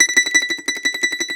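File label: Mandolin tremolo, Plucked Strings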